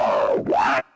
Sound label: frog; The Monster Plane; kolmods preatt